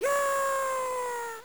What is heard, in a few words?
fall